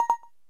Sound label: AF Completed, Camera; LG GD900 Crystal